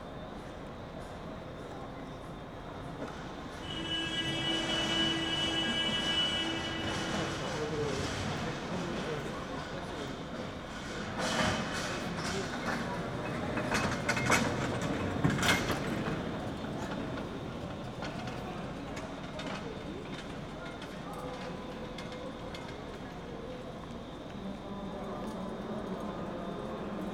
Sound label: Train, Station, Light, Air Blast; Poetry Project